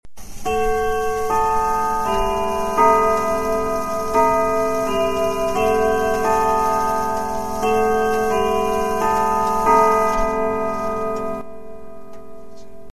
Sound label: Westminster 3 quarter hour